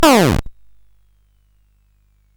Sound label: Frantic, CSG8580R5